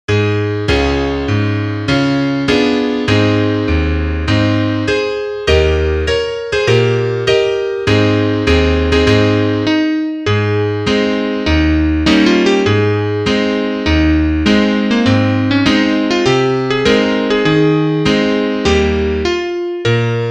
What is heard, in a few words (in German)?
Klavier Solo, trompete; Parademarsch